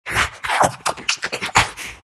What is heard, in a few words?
big lipsmack; Undying